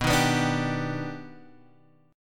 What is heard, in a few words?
Eb Augmented Major 7th B